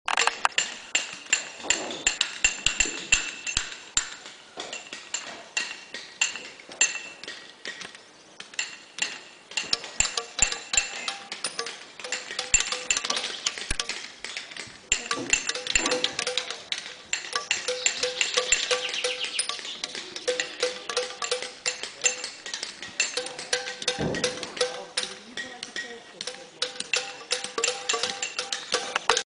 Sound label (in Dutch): zo klinkt beeldhouwen